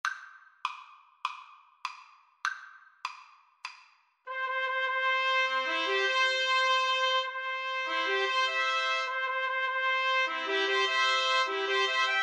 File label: wedding march TPT TRIO, Trumpet Trio